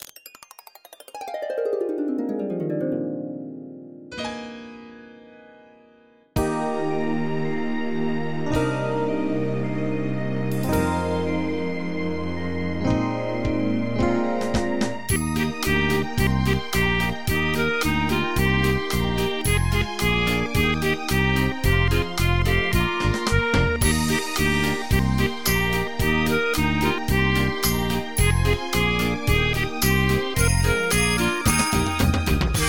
jingle

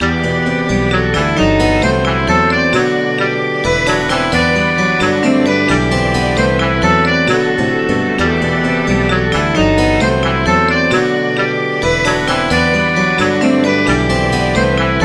Prog Folk

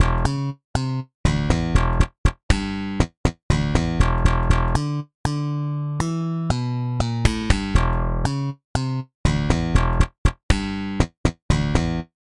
slap

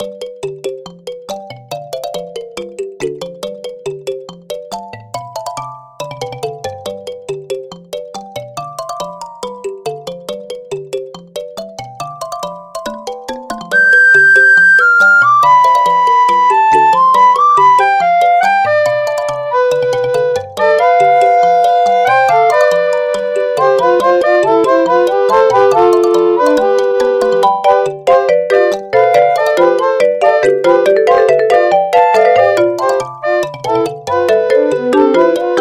Flute; Gnome

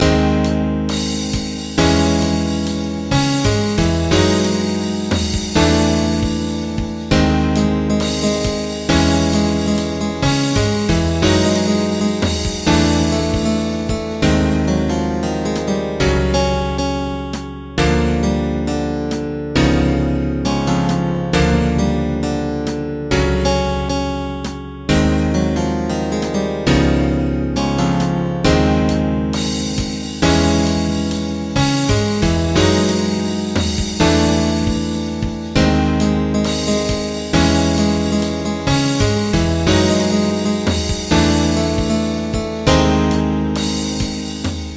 Acoustic Session

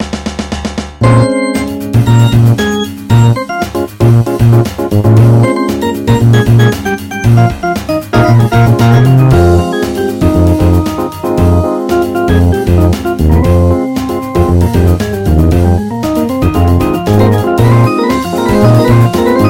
Big Solo

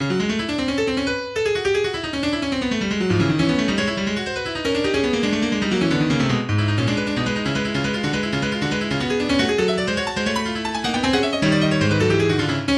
Fugue in F sharp minor

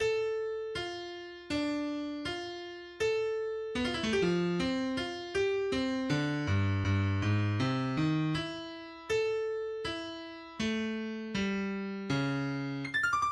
Piano

